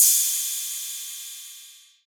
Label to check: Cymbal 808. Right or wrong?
Right